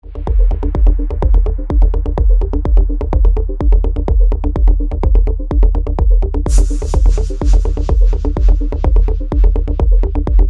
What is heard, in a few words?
minimal deep tech electronic new age